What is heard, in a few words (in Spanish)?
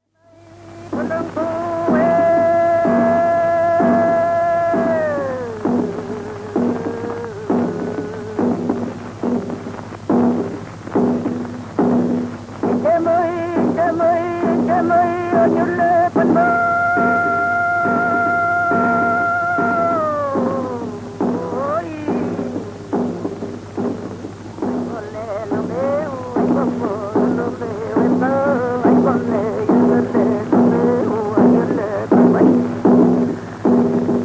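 Canto de machi con kultrún, Mapuche; Collico, Puerto Saavedra, Chile; Música Mapuche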